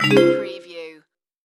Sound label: Multimedia Chime